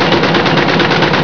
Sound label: MECH BIG, MACHINES